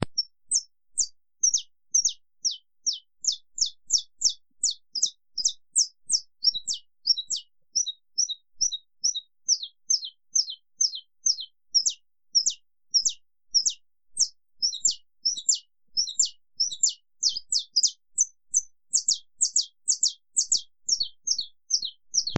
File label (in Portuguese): Canto Canario da Terra Estalo Canto Longo